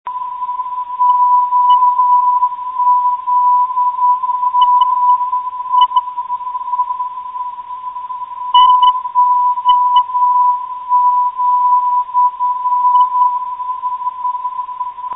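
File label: ozn 372khz, non-directional beacon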